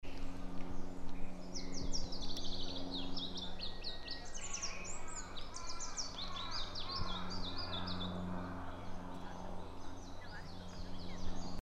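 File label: karafuto mushikui song, Pallas's leaf warbler; saezuri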